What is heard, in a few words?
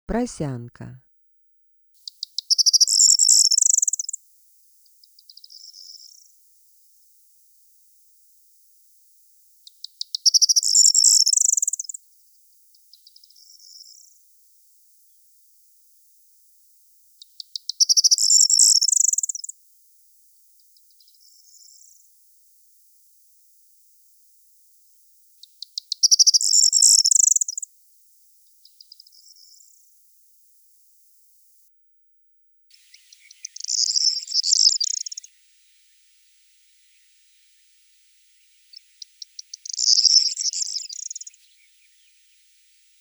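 corn bunting voice; prosyanka golos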